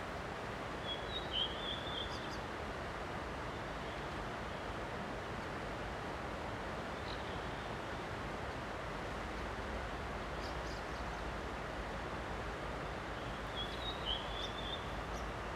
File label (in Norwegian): valdresfugl